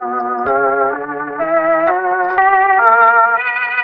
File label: keys